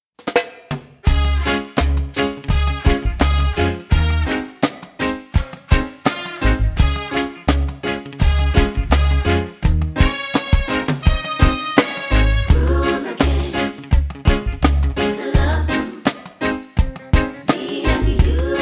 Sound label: Groove Again